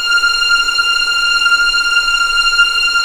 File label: F6 LEG P, VIOLINS; East West; The Ultimate String Collection